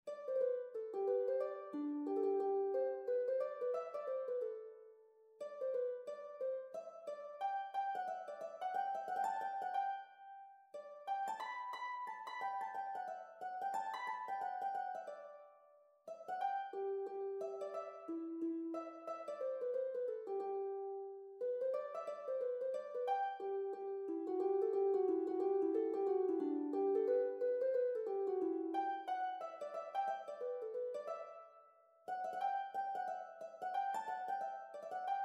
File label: harp